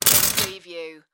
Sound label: Electrical Arc Zap